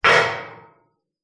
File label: impact metal panel or pole hit small hard object resonate